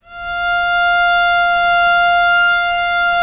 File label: VL F4, VIOLE, Historical Instruments; USB Soundscan vol.23